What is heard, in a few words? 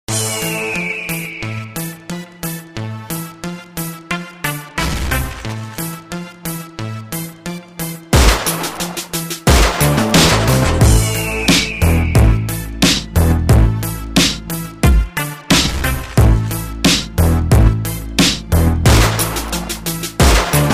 beat